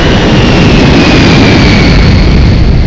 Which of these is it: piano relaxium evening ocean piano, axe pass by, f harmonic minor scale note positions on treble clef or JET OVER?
JET OVER